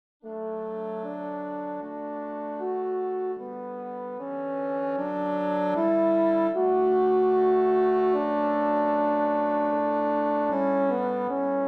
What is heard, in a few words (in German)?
Horn